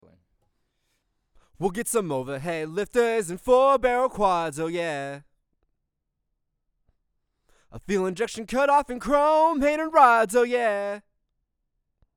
MXL990 Male Vocal lyric 12 inches from mouth

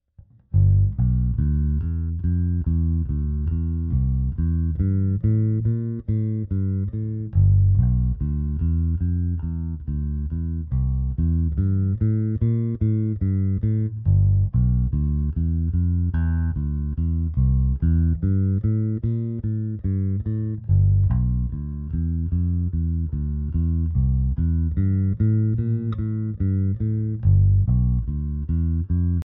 Bass 1in from amp grill center of cone

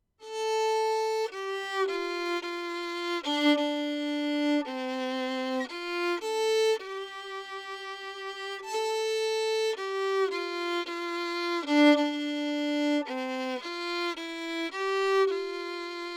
Cardioid Violin Lyric 24cm from F hole; Little Gem

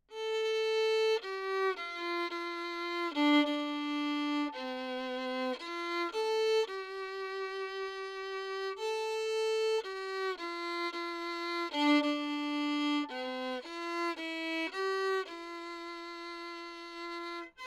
Violin 24cm from F hole; MXL990

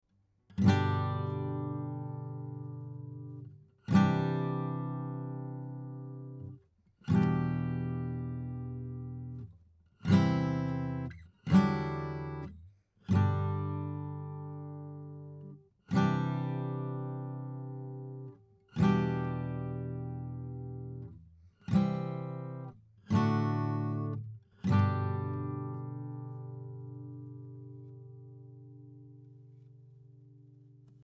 SSGuitar Chords 15cm from tone hole; shure sm57

